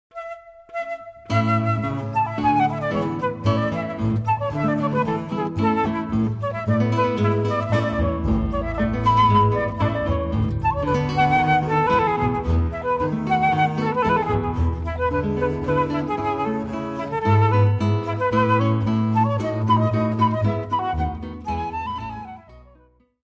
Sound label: Sonata, guitar flute bass trio; Scarlatti